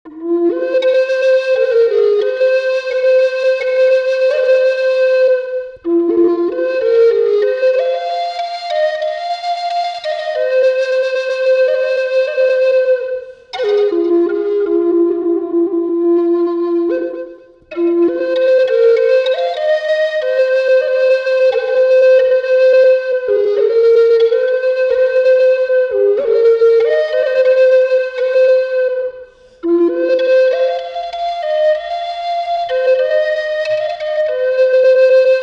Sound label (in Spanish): maple F, flauta